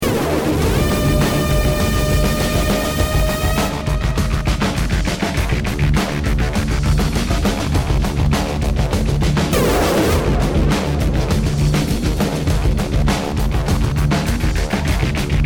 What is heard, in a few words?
start action, music; halflife alyx